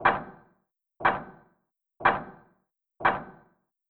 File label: clock tick tock short loop